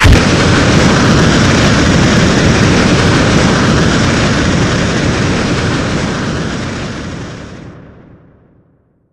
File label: grenade, weapons